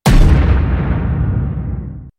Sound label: Hoof Stomp; Centaur Warrunner